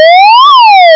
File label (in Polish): syrena